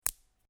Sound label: Marble Impact With One Marble; A marble or small pebble impact; Miscellaneous Toy Sound Effects; LynneMusic